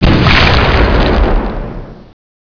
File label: gunner boom; gearbox